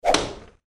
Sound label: popup